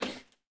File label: Scarf Close; Game rip; MiSide